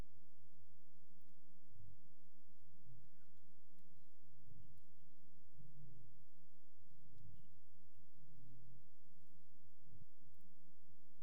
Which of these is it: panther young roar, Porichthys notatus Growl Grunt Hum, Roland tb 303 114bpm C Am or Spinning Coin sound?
Porichthys notatus Growl Grunt Hum